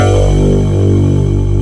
SYNT G1; AKAI S-Series CD-ROM Sound Library VOL-2; SYNTH

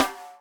snare, Millenium MPS-400

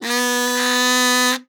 KAZOO C4; Xsample VOL-9

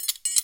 Kitchen Foley Dry Drums; Antidote Advent; Kitchen Foley Sounds & Loops

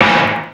Fairlight CMI III Snare, Drum Machines

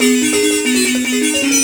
BZ XY GRV, BUZZ XYLO; Spectrasonics; Heart of Africa 2

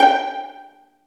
String Vlns Pizz; Roland - String Master Series; Vls Pizz

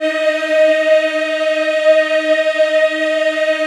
D#4, Choir Acoustic & Synth; USB Soundscan vol.28; AKAI

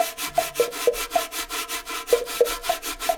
Roots Reggae; Sounds Good - Roots Reggae

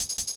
Ezee Rolla Cut, Drum Loops; Zero-G Jungle Warfare 1, 2 & 3

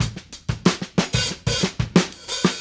Call And Response Drums Fill, 70s Analog Funk; Sonic Foundry (Sony Creative Software); What it Is!

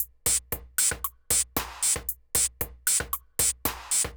Drumloop 115bpm, Nu Disco; Zero-G

